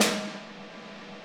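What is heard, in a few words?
Snare Hit Loop; Digital Sound Factory Virtuoso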